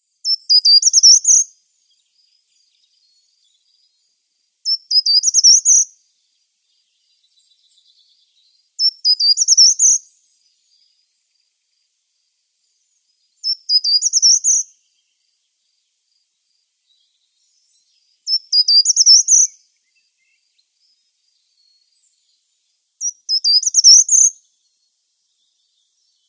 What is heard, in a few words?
Short-toed Treecreeper song